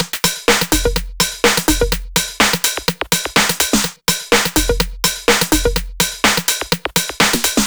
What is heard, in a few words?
Housed Beat